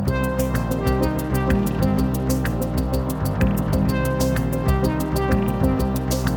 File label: Electro